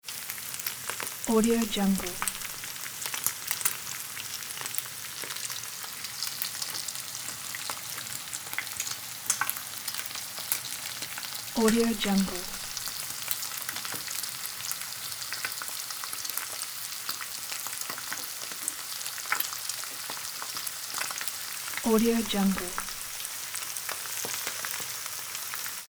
frying pan

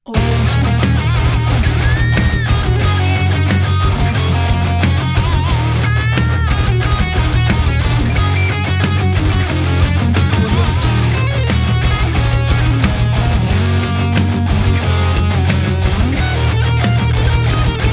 metal guitar solo

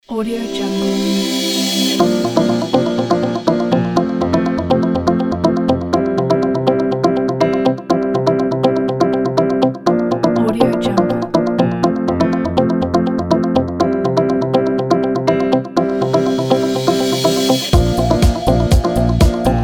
motivate corporate

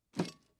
armor; prosoundfx

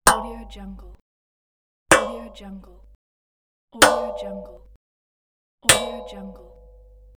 metal hits

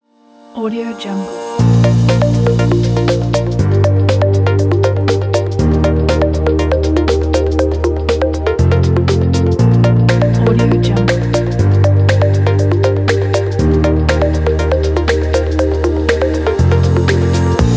positive upbeat

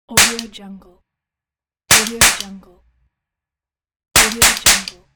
toy cap gun shots

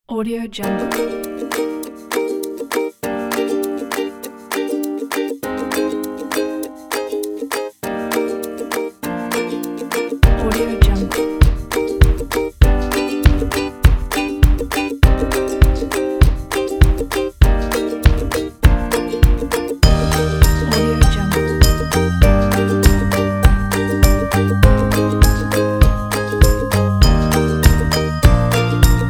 positive happy ukulele

